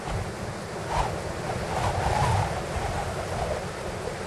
Wind Gusty Loop; weather fx; Silent Hill Downpour

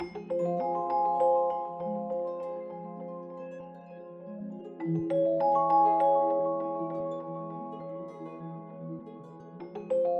Rail Car Harps; Silent Hill Downpour

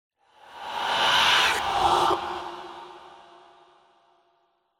Whisp Tense; horror and atmosphere fx; Silent Hill Downpour

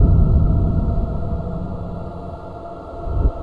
Stairs Pulse Loop; Silent Hill Downpour

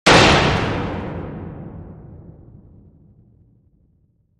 Scary Hit Metal; horror and atmosphere fx; Silent Hill Downpour

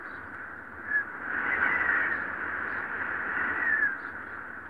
Wind Whistle Loop; weather fx; Silent Hill Downpour

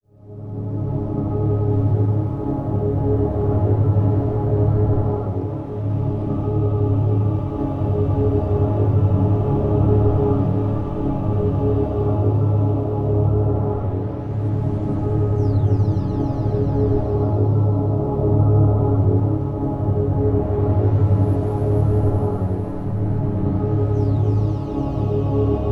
Forest Trail At Night; fanfiction

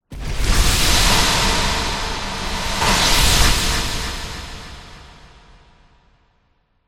Fall Whoosh and Rev; horror and atmosphere fx; Silent Hill Downpour

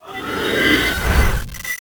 Rotating Worlds Matrix Mix; horror and atmosphere fx; Silent Hill Downpour

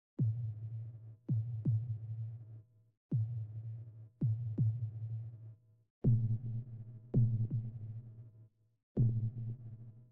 Heartbeat Tension Loop; Silent Hill Downpour

